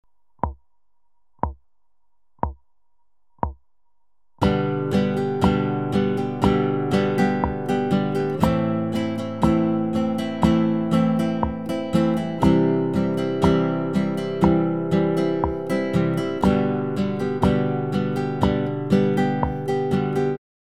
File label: strumming